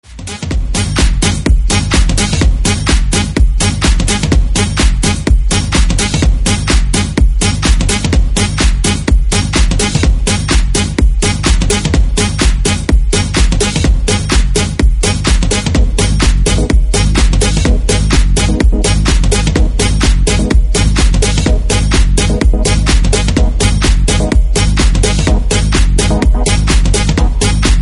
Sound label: Blow Your Sax